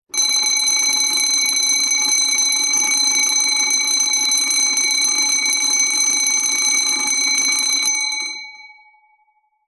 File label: emergency bell alarm fire or burglar ring